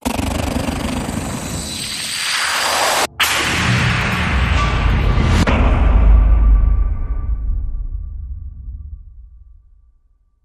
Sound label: Hit Force to Bear